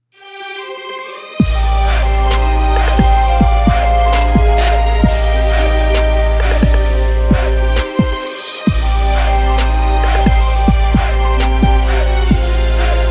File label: trap remix; the godfather